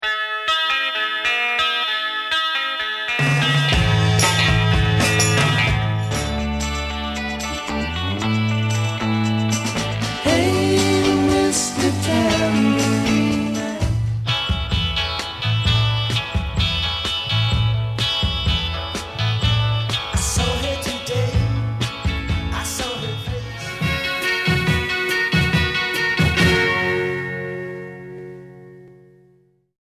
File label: 12 string examples